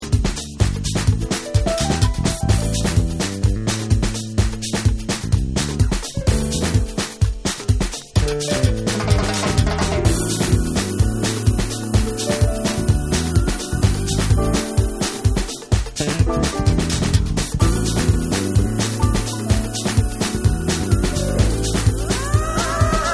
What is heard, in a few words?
Vocal Mix